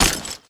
Orc Clothes

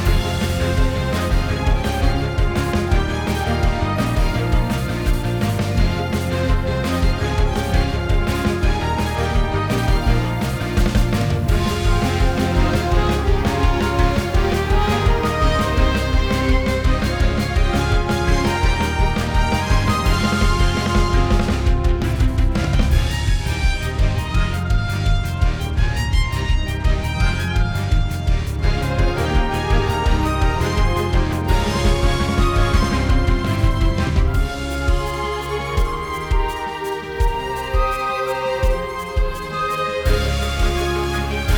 Heroic battle; Grimlight: A Tale of Dreams

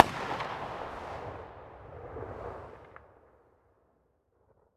Sandhole; starbites

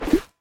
Ui Popup Move Open; hello kitty friends match; android

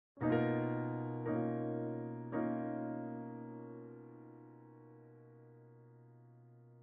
Piano

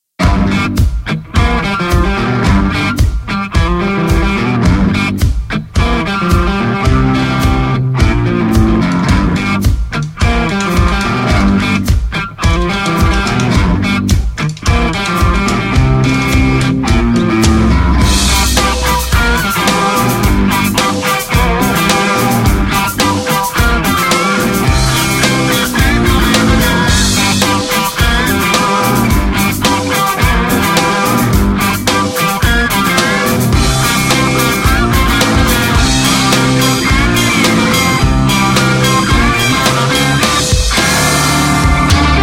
Blues; the muscle hustle; android ios

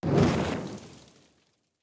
Fire

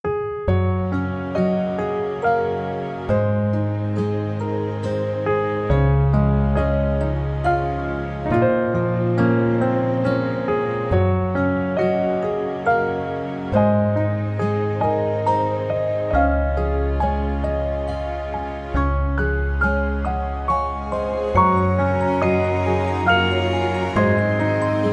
Romantic; Grimlight A Tale of Dreams

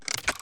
Lever Off

